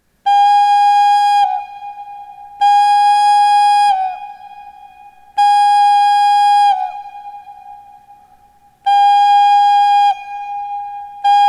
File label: ocarina